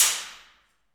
Aluminum Wall, FX Perc; Roland L-CD701

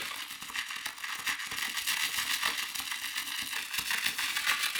Cracklature, sparse soundscape

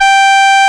FM ALTO G4, HORNS; AKAI S-Series CD-ROM Sound Library VOL-2

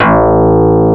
FM Synth Bass, Roland

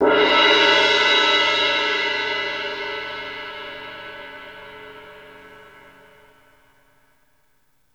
C18 PP, orchestra hits; Best Service; Peter Siedlaczek's Advanced Orchestra

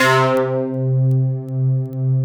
C2 FM, Synth Line; 90 s Sample CDs; NiceBeats; SynthLine VOL-20

